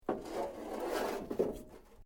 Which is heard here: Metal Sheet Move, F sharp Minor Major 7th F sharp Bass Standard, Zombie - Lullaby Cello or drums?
Metal Sheet Move